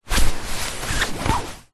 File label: household small foam chair fabric movement; zapsplat